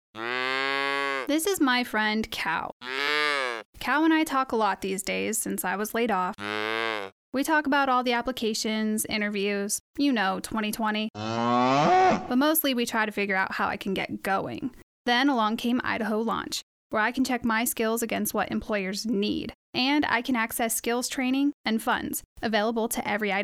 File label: Cow